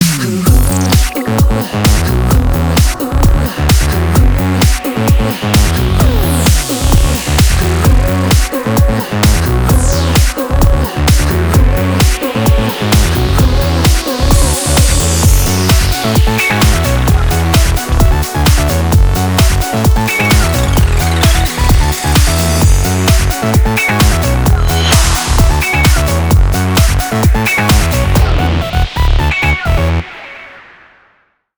Музыка для Рекламы; TVC Music 30'

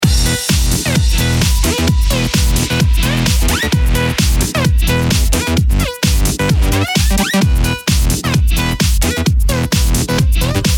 саксофон и хаус

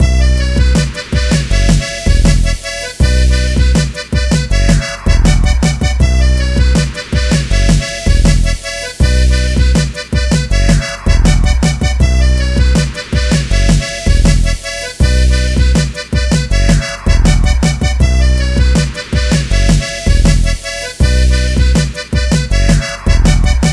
Hip-Hop Гармошка рингтон; MbHPRO

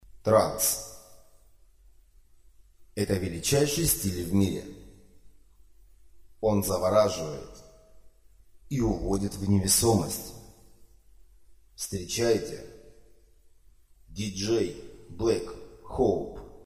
подложка под джингл

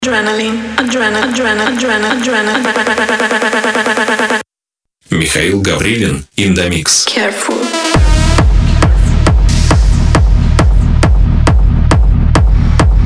Звучание джингла на треке

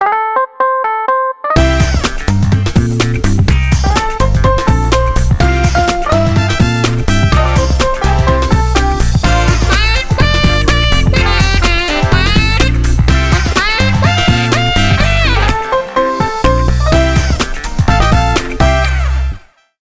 Музыка; для Organic TVC Music 30'

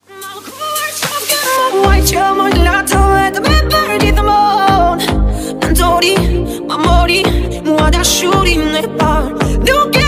РИНГТОН

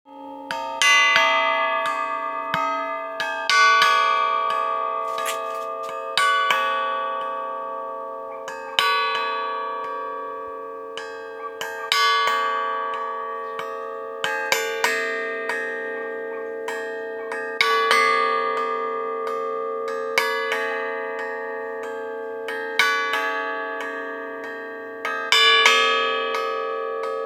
рельсоколокол

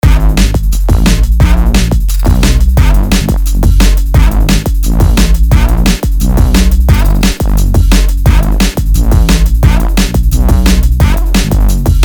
КРУТОЙ ДЖАНГЛ

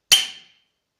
Удар барабанной палочкой

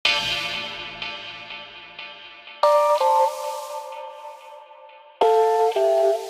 Сампр Hip-Hop

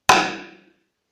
Удар барабанной палочкой

